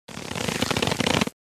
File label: Archery bow draw